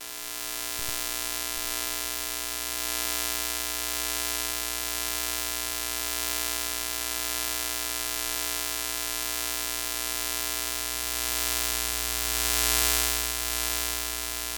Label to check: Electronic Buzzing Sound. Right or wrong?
Right